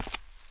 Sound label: move; GrandFantasia